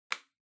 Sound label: Calculator Switch